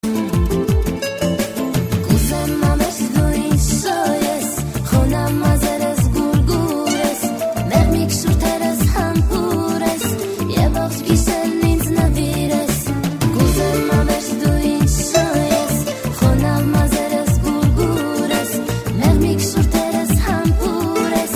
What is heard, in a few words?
Chill Out Music